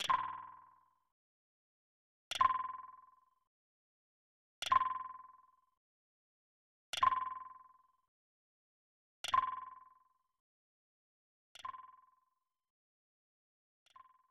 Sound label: Water Drop